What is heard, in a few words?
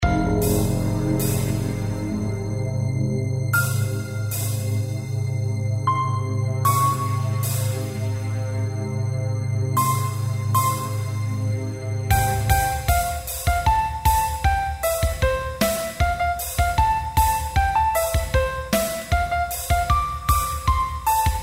waltz